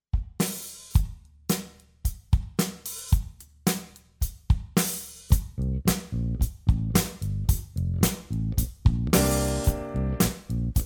Pop, Drum Fills; Essential Drum Fills; Erskine

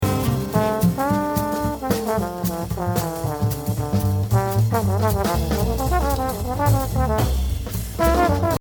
Exercise, jazz drumming

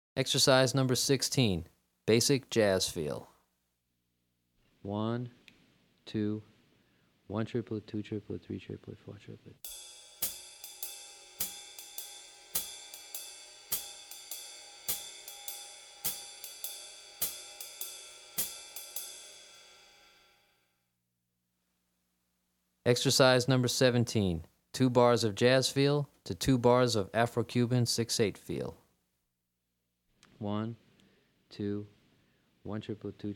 Afro-Cuban 6-8 Feel in a Jazz Setting, Drumset

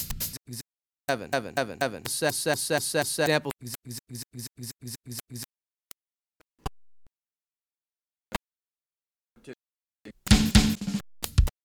Afro-Cuban Grooves For Bass And Drums

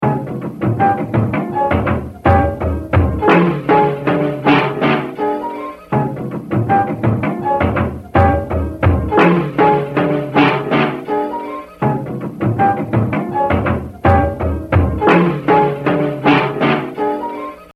Exercise 5, Jazz Drumming - 70%; Baby Dodds; The Evolution of Jazz Drumming (Gottlieb)

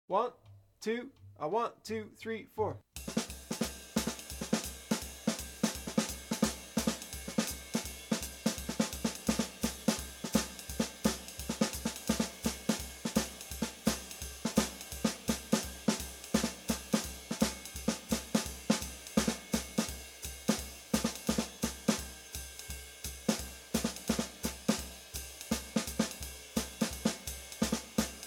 Comp Ex. fast, Bop Drumming; The Art Of Bop Drumming; Riley

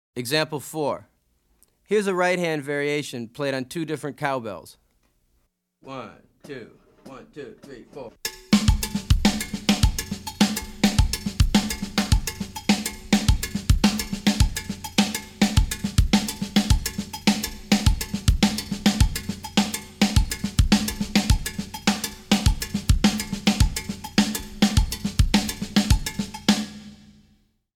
Afro-Cuban Grooves For Bass And Drums

